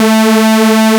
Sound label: chorus, tone